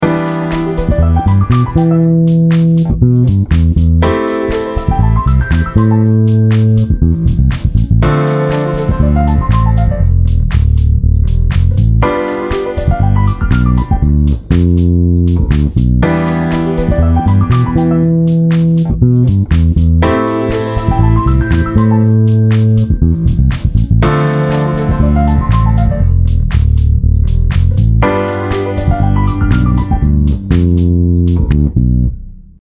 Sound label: bass Cmajor, Cma7 – Ami7 – Dmi7 – G7 in the key of C; Let’s take a common progression like a I-vi-ii-V; a bassist's guide to chord substitutions